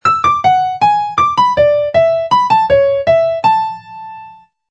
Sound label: Grande Valse piano